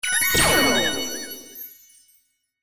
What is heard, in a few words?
Jackpot Grand; slots; Robot Vs Monster